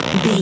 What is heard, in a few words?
foley leather stretch couch chair